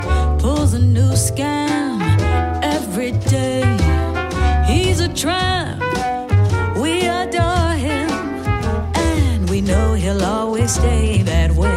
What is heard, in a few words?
english music ringtone; english ringtones